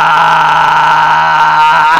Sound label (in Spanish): motor; Sonidos raros